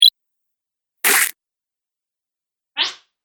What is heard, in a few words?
Videogame Fx; Sound Pack 7; Video Game Sound Effects